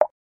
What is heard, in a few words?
water droplet; ion sound